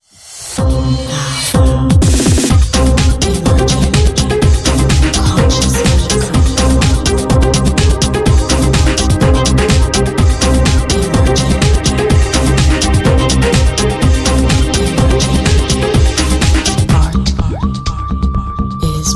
ELECTRO

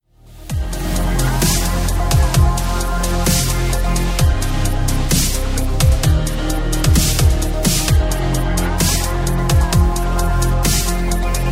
LOUNGE

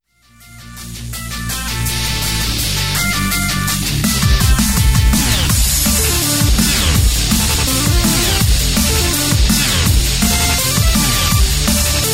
ENERGETIC

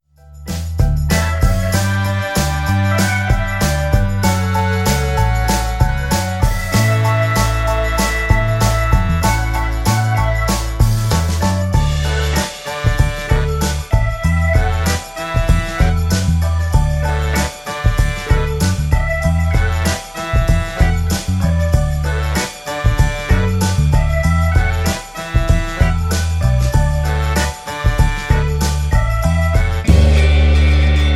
EASYLISTENING

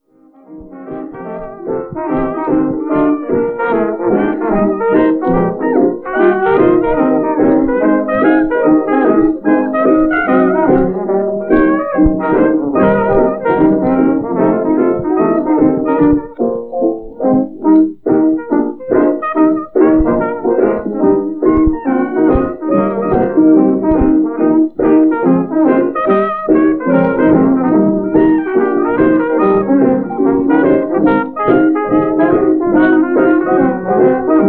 OldJazz

